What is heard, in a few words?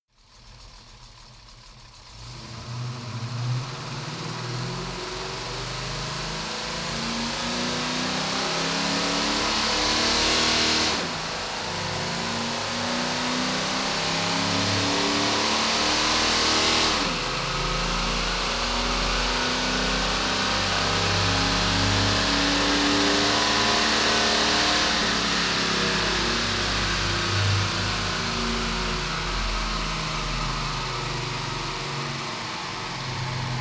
car camaro accelerate decelerate shift engine